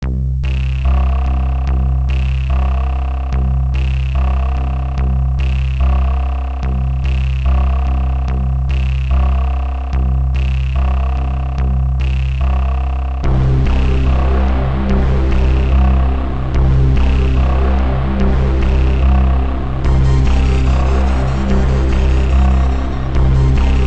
Scifi Theme

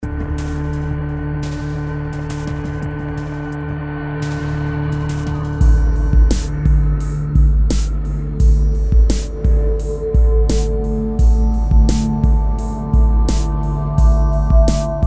beat

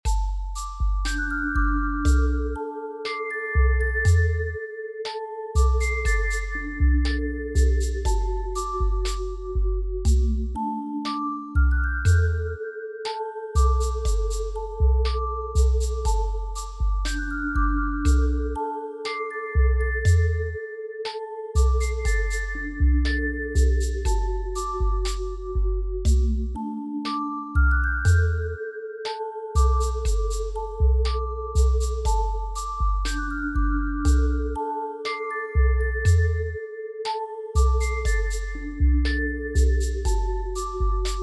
bewildering bells loop

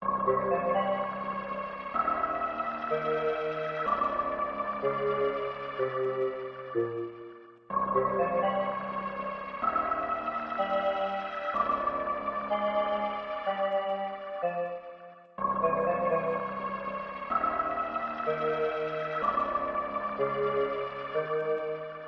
Spooky Dungeon

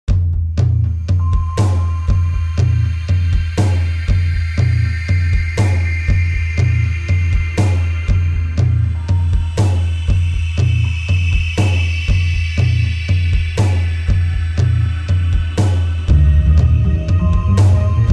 Mysterious game music

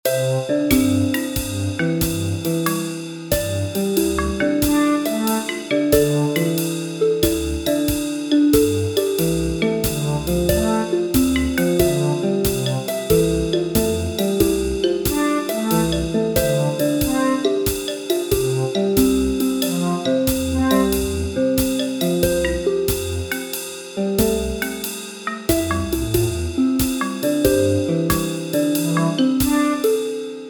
background music

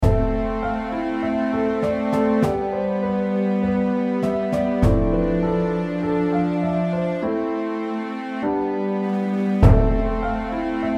Traditional Orchestra Victory; AuguryEngine

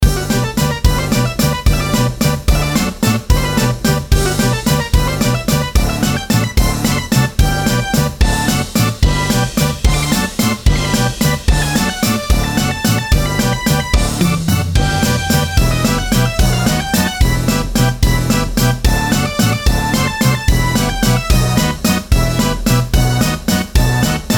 carnival background music

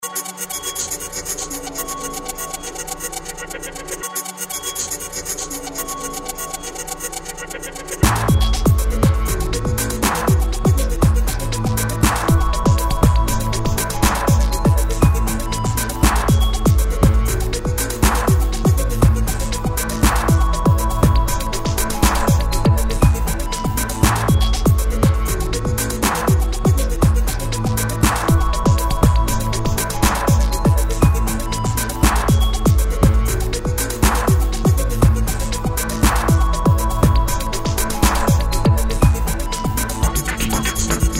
Tech Jungle, game music

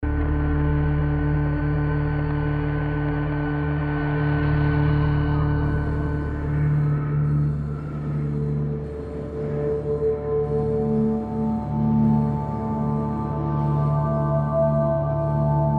Dark City ambient

